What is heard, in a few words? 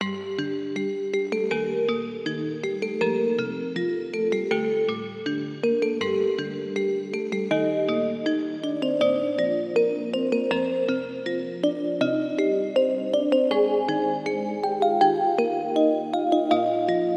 Key